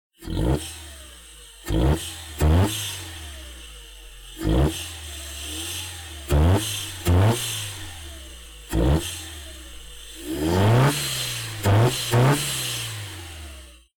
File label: Car Airfilter